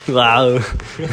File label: waaaa